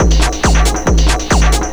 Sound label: drum 138, Techno; Techno ID; PS-45